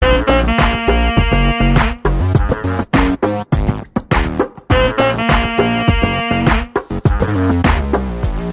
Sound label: Saxophone